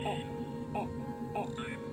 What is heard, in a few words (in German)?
nicht moeglich; Machinarium; gamesoundopedia